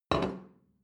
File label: plate place table medium short, restaurant cafe; sport-freizeit